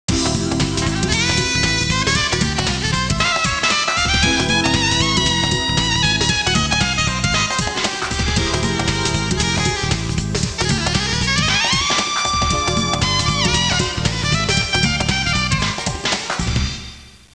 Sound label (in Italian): suonerie musica jazz